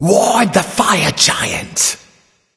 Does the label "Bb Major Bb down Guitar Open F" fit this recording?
No